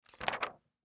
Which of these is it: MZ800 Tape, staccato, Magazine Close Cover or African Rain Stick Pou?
Magazine Close Cover